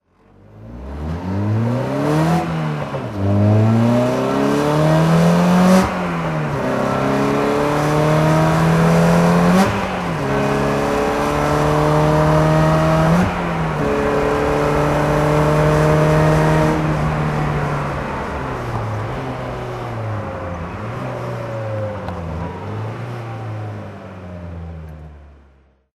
caterham Super7 driving

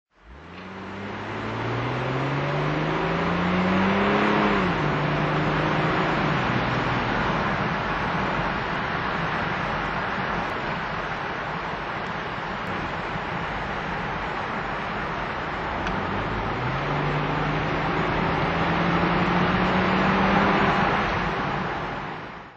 jaguar XJS 4L convertible drive

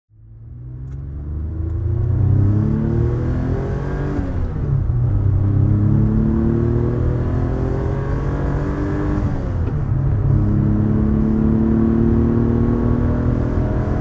Maserati Merak SS driving

